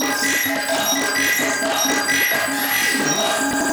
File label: Pro One Loop, Keyboards